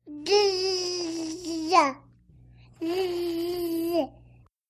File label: Baby Girl Insect Soun, twenty month old